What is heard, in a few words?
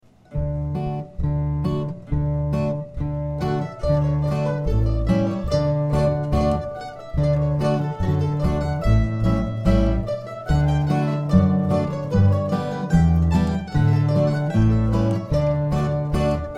hornpipe